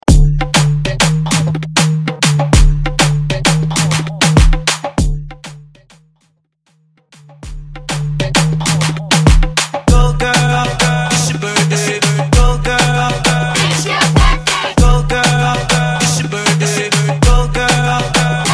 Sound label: Moombahton RMX; Mainstream VIP